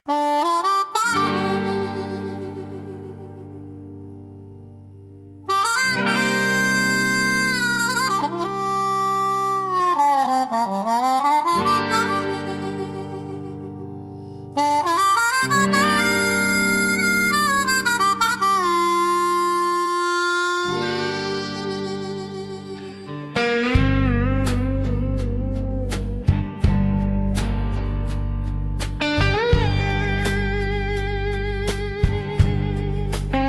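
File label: ukrainian beat, sopilka blues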